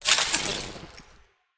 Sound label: Ice Shoot